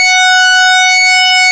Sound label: SYNC, ARP Synths; Analog Odyssey; E-MU Formula 4000 Series Vol. 3 – Analog Odyssey